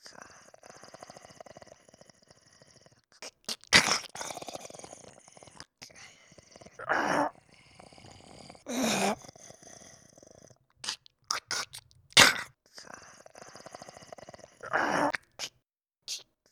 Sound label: ant merchant idle loop; Hollow Knight: Silksong